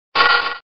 Grito de Lileep

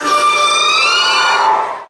Grito de Froslass

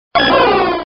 Grito de Celebi

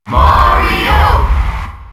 Grito del público; Mario; SSBM

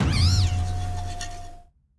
Grito de Furioseta

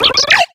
Grito de Pachirisu

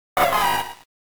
Grito de Torchic

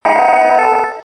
Grito de Deoxys

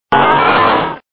Grito de Metang

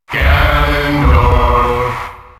Grito del público; Ganondorf; SSBM

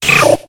Grito de Cyndaquil

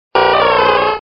Grito de Hitmonchan

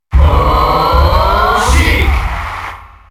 Grito del público; Sheik; SSBM

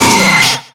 Grito de Pinsir

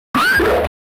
Grito de Gabite

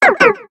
Grito de Cubchoo

